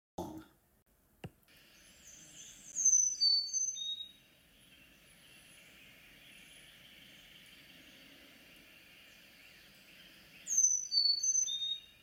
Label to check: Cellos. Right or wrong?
Wrong